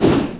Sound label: preattack; weapons; dota; creep